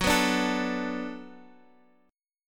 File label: D 6th Fsharp, chord